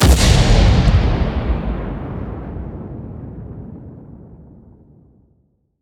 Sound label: impacts; World of Tanks